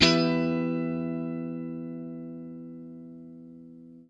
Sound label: CHORD LN 7, guitar; Quantum Leap - Guitar & Bass